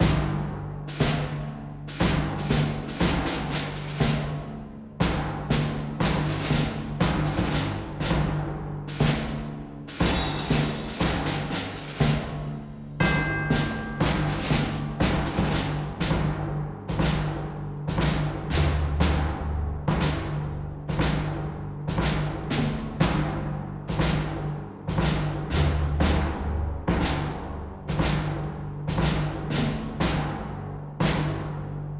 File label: Music Debrief Good Loop